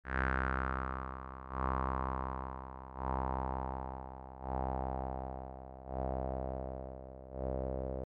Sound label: loop amp envelope; albino